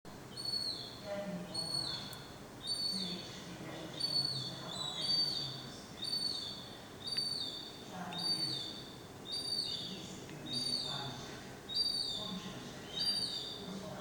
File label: besiktas apartment shaft seagull